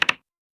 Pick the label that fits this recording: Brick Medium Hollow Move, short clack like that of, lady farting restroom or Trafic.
short clack like that of